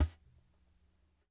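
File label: Large Dumbek Damp; Sony CS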